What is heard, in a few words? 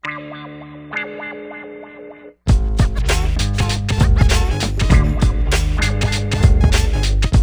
99 BPM, Hip Hop; InVision Interactive; 40 Oz Phat Slammin